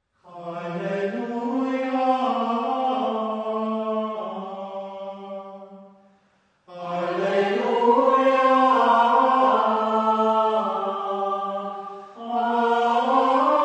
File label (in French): chant bois aubry